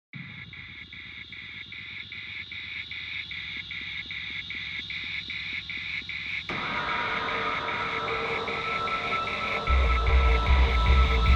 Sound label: Mechanica Industrialis